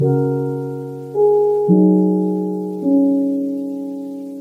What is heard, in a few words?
theme; minecraft